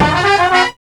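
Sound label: horn stab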